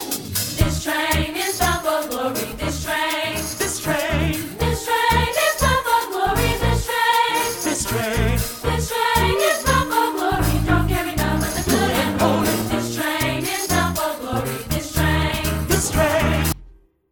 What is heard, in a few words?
This Train, Allegro